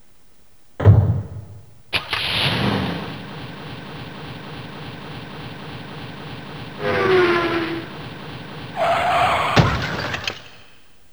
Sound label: car crash